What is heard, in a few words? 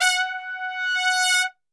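F#3 trumpet; Best Service; Brass Super Section; TRP SWL; 90 s Sample CDs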